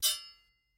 sword hit

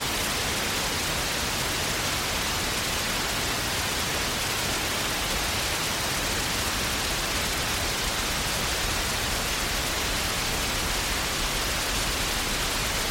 waterfall

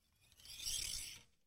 archery foley arrow pullback; SoundsCrate

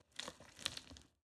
squishy short; soundscrate

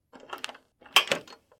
door handle turning

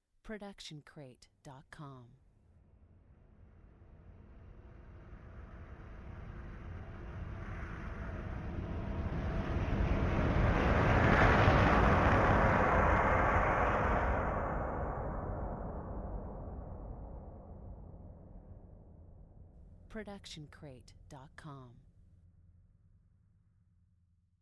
Fast Horror Riser; SoundsCrate

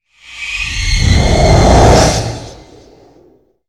fly by

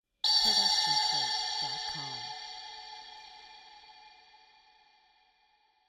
Shimmer Hit; SoundsCrate

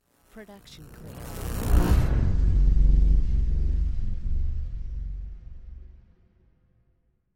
electricity hum enter

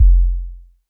electronic kick

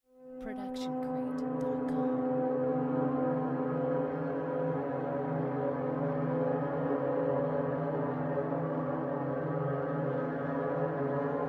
Distorted Strings Ambience; SoundsCrate

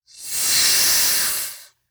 snake hiss; soundscrate

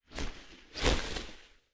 wing flap; soundscrate

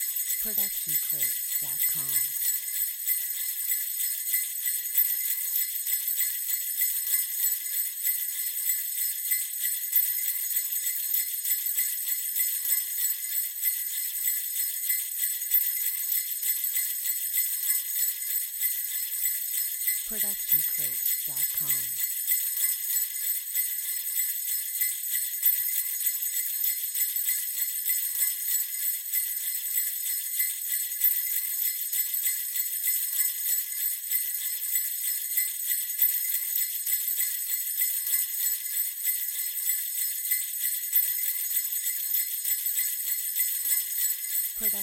christmas tree bells

